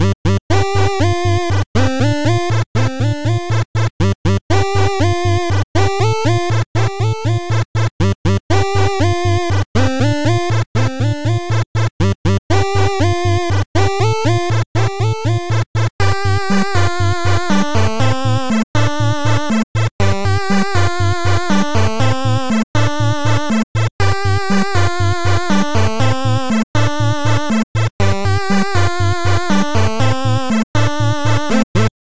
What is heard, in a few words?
select mountain bpm120, 8bit action stage select